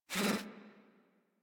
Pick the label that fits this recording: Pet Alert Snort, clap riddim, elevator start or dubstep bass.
Pet Alert Snort